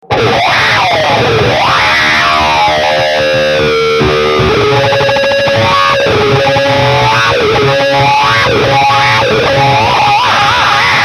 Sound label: wah; rocktron; blackcat